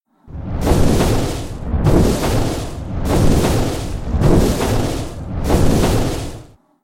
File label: Visage attack